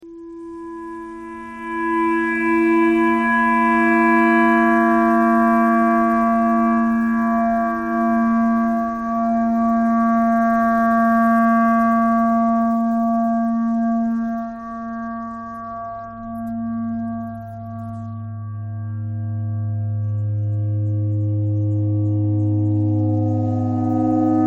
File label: clarinet clarinet thread noise